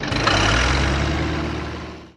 tractors; vehicle

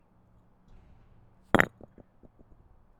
brick delay, Stone

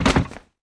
brick drop Into Wood; stone

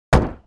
wooden big

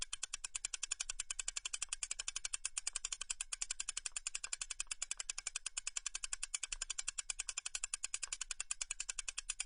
fishing not reeling

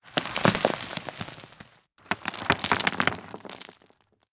Sand Silt; Land

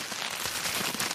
plant

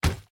Doorframe

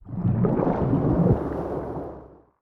hunting fish capture

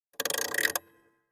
spin windup

